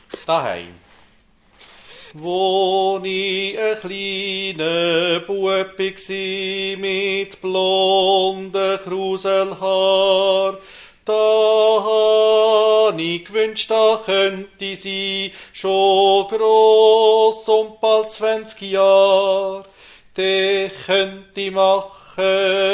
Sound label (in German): Bass